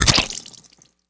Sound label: bite hit, sea lion monster; zoochosis; deep sea; lazynap